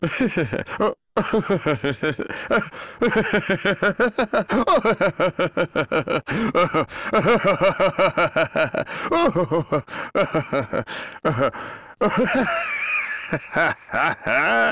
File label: laughing